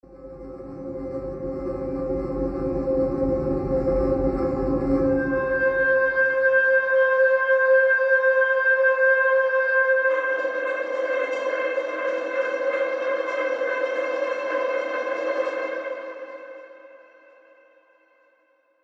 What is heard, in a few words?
Guitars Pads Hi Ambient; Scoring Guitars